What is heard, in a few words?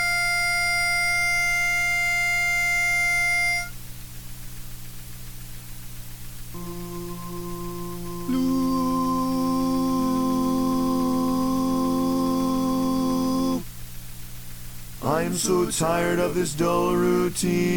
lead mono